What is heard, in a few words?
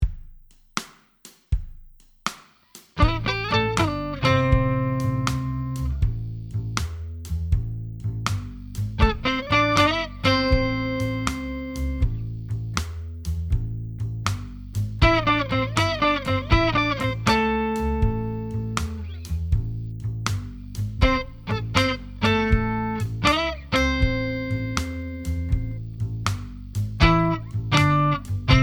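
octave guitar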